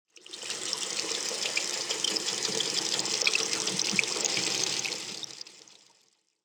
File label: water